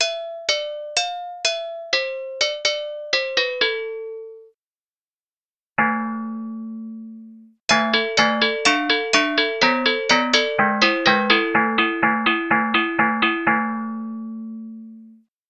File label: agogo samples